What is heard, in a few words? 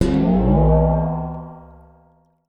WATER GON, GONGS; Voice Crystal - Steve Reid Definitive Percussion